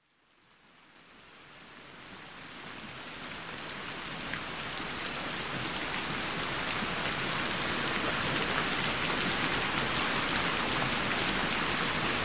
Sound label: Rain